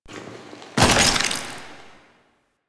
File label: Pickup Scanner Ground; Warframe; Entrati; Swarm